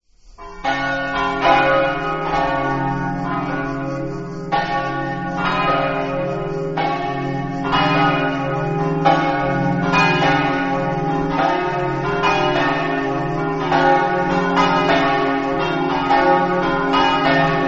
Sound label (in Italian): campane; villaorba